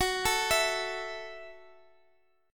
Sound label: F sharp Minor 7th, guitar; F#m7 arpeggiated; chord shape; x,x,x,11,10,12